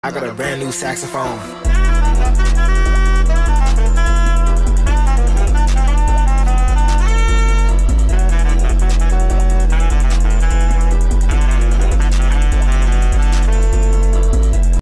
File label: saxaphone